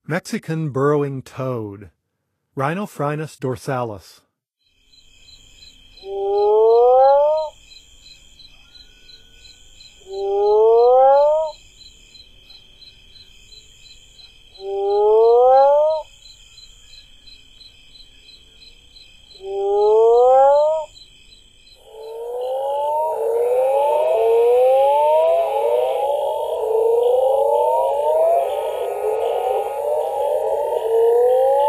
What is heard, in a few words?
Mexican Burrowing Toad; N. America; Songs of Frogs & Toads of North America